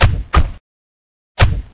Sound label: doors shut; Source Engine; bshift